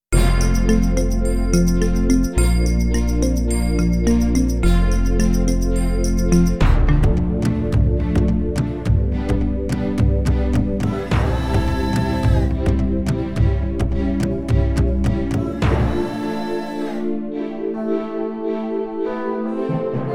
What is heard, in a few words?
Jungle Trailer